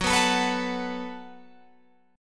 F sharp Minor Double Flat 5th F sharp, Guitar Open G